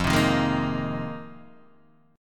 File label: F Minor 11th, Guitar DADGAD